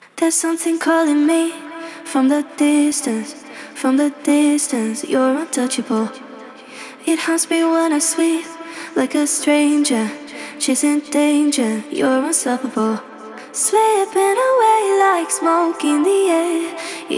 Acapella 140 BPM A Min; Vocal Loops; Lately

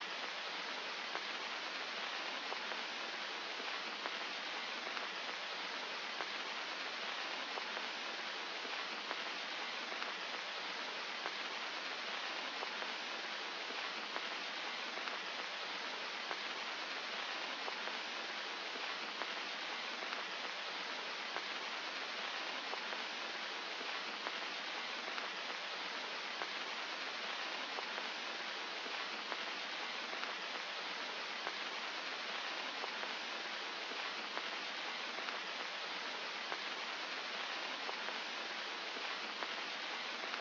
95 BPM C# Min Ambience; Melody Loops; Ambient; Arrival; Cymatics; ANALOG EVOLUTION Beta; Ambient Collection

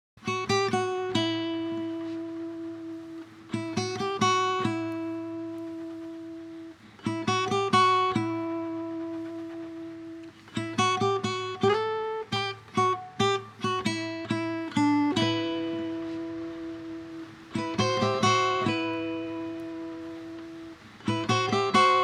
137 BPM E Min Lead Guitar; Cymatics; Miami Guitar Pack - Pt 2; Snakes

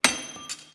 fx bing, Cartoon Film Effects

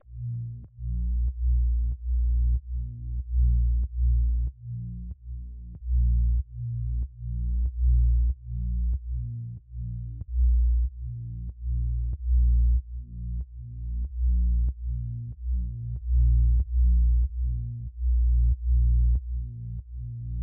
94 BPM A# Min Bass; Loop Stems; Cymatics; 2022 Melody Collection Platinum Expansion - Pt 2; Prophet

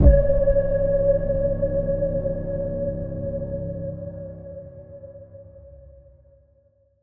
Impact

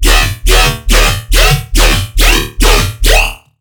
Riddim Bass Hit D#; Cobra

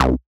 Techno Synth Shot C; 45 Techno Synth Shots 2025; Synth One-Shots

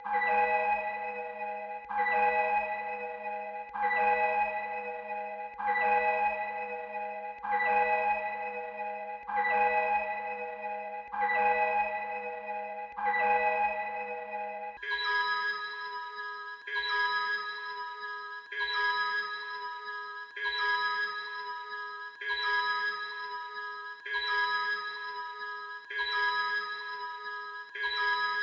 130 BPM G# Min Bell; Loop Stems; Cymatics; Hyperactive

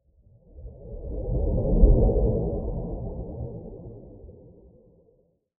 Whoosh

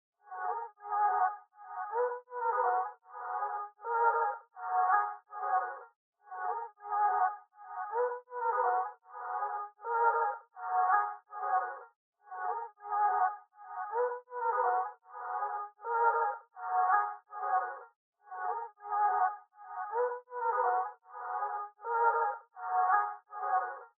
160 BPM D# Min Ambience; Beautiful

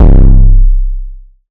Monster 808 C; Drum Samples; Cymatics; PHARAOH

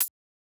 Hihat, open HiHats

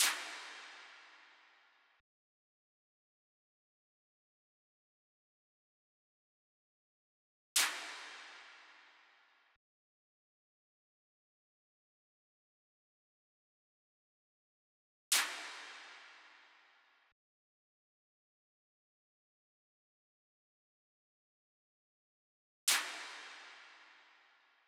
127 BPM F Min Stomp; Trap Melody Loops; Loop Stems; Cymatics; Nightmares; Major

